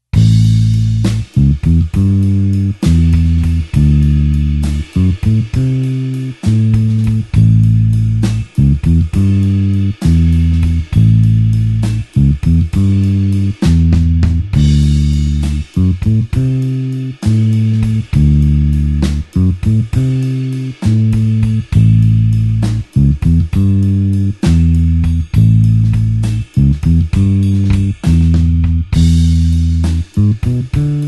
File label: blues bassline slow A